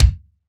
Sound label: Std. Kick; Roland BK-5